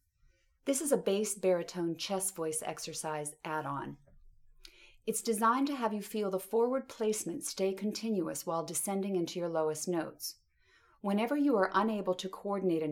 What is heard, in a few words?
Bass Extension Exercise Intro